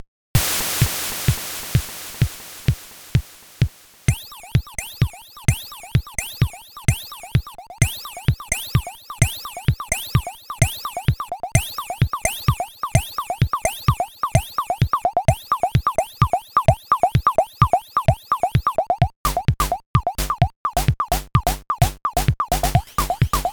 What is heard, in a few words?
sms plus techno sms opll; weeklybeats 2026